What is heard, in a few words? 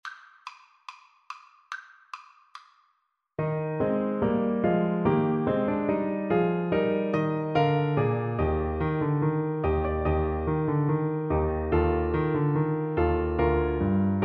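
bach march in d, alto saxophone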